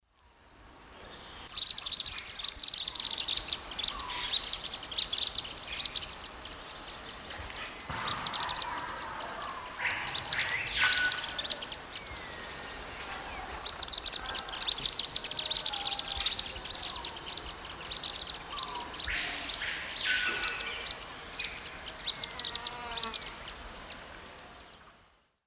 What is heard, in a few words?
Chaetura brachyura, flock calls; S. America; Eastern Lowlands